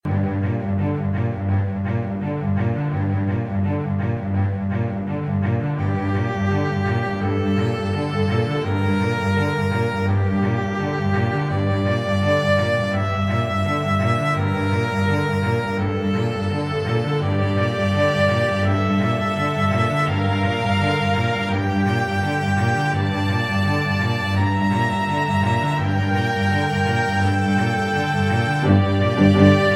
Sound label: Riff Drums